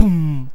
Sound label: Beat Box Kick